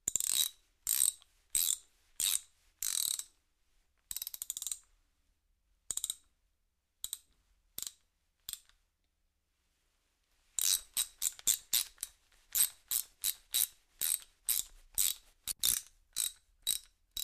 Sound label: Hand Tool, Ratchet Action, Various Speeds; ratchets